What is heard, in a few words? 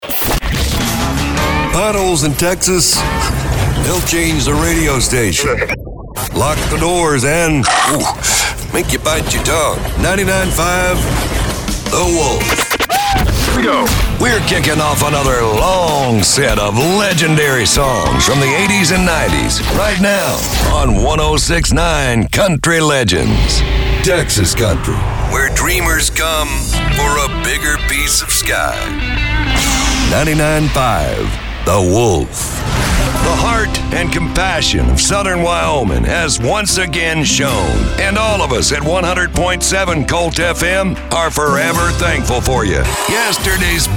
Country